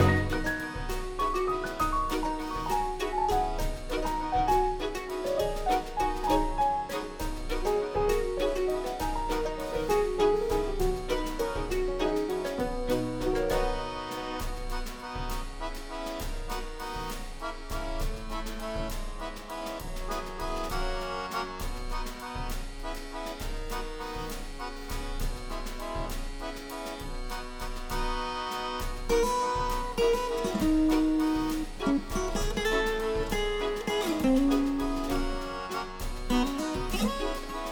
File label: Music Ingame Restaurant